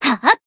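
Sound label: attack, Japanese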